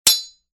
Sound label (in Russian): udar metall; Звук мечей; Звуки сражений на мечах, звук ударов меча